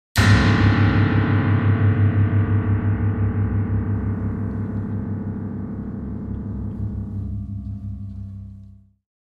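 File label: Grand Piano Strings Deep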